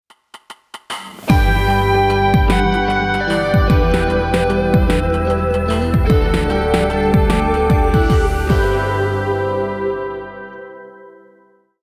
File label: synthwave